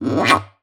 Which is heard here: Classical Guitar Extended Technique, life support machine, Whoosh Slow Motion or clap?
Classical Guitar Extended Technique